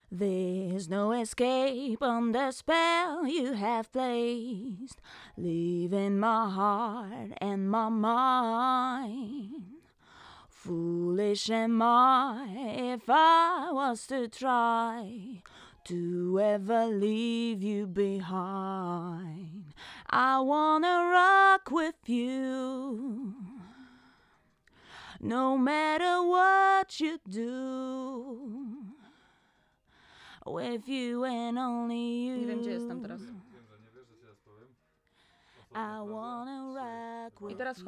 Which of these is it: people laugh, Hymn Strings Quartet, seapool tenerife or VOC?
VOC